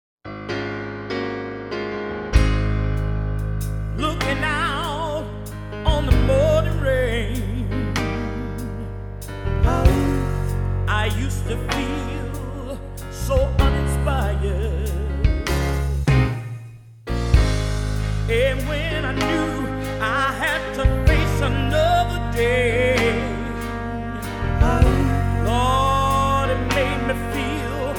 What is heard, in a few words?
Variety Band; Atlanta